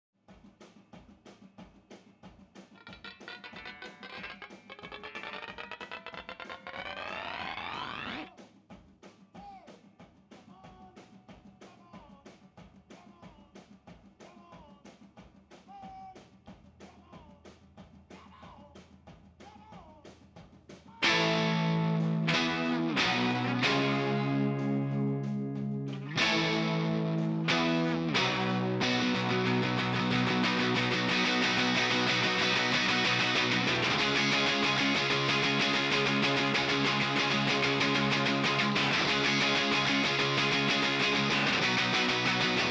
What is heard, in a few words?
Guitars